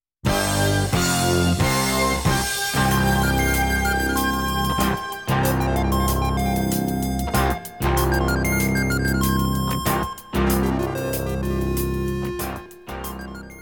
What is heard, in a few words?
News Intro B, jingles